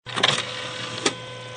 Ouverture cd-rom; bruitage; Electro-menager

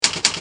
Machine à ecrire; bruitage; Electro-menager

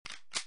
Rechargement, Armes; bruitage

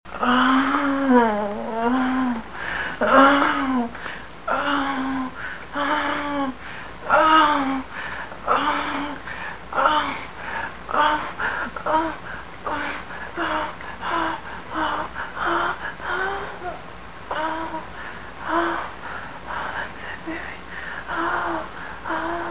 Orgasme

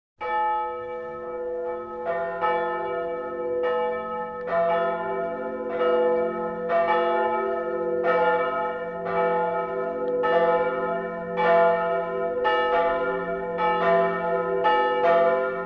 Cloches petite eglise

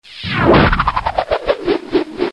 Maniment de sabre laser

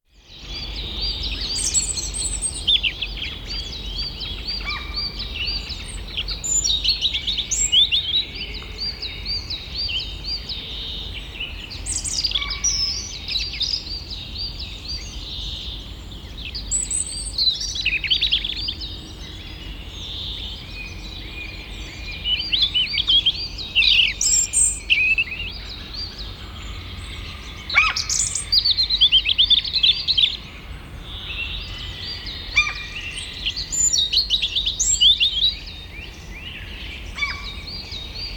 Les oiseaux en foret; bruitage

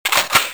Chargement de fusil; bruitage; Armes

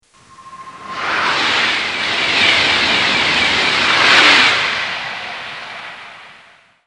Tgv

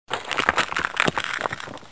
Applaudissement; bruitage

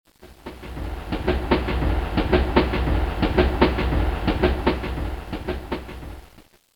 Train wagon

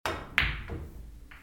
Billiard boule touchée

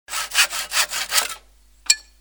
Scie; bruitage; Outillages

